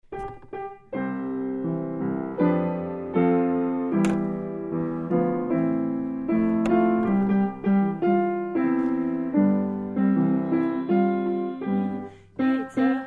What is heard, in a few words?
tenor